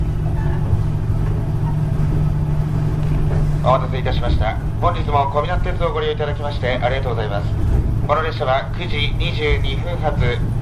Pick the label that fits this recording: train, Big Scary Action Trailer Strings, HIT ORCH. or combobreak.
train